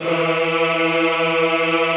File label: EHH E2, Classical Choir; Best Service